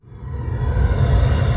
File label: Sweeps Medium